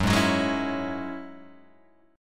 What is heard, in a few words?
F sharp 7th Suspended 2nd, Guitar, Open G